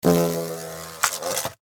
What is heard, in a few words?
Wasp Fly Wing, Take Off, Land, Buzz, Close, Insect; Animal; Bug; Hornet; BlastWave